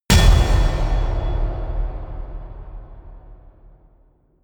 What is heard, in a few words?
anvil hit